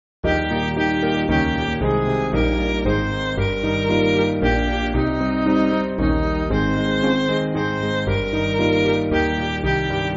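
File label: Midi, Music Backing Files for Churches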